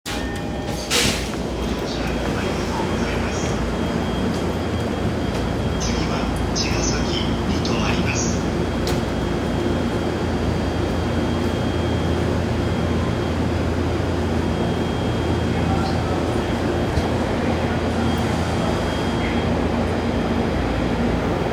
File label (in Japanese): JR東海道線平塚北, train sounds